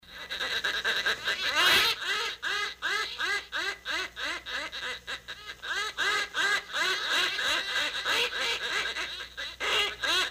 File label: geese laying